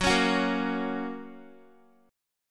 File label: Gb Major Gb Guitar; Gb strummed